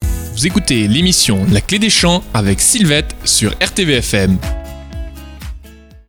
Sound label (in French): jingle; cle des chants